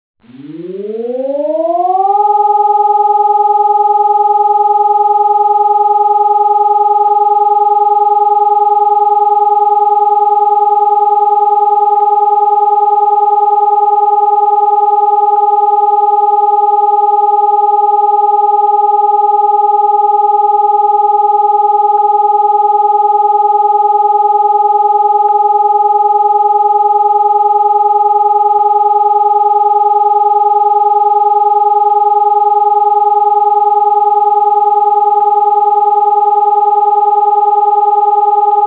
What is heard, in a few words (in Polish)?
alarm ćwiczebny, Sygnały powszechnego ostrzegania i alarmowania